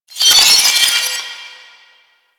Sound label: Citrine Empowered Geode Loop Start A; Warframe